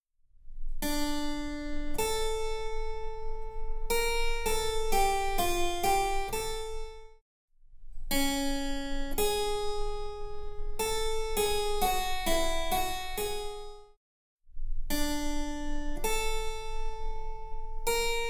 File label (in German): Cembalo; Mark Lindley WTC 1